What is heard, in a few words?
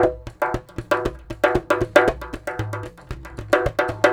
116 African Stickydrum; World; African Percussion